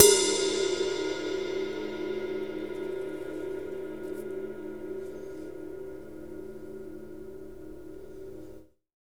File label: RIDE20HV BRU; Big Fish Audio; Ross Garfield Drums VOL-2; CYMBALS